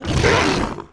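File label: attack heavy; roba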